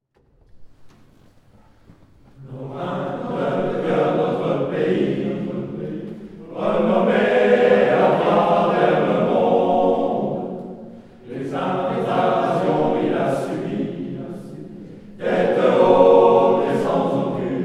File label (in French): hymne à la Lorraine, choeur d'hommes; Choeur des 3 Abbayes; Choeur d hommes des Vosges